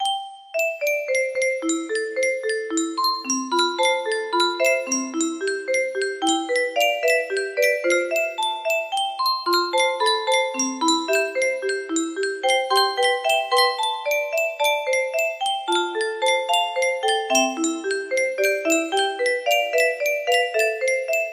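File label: music box melody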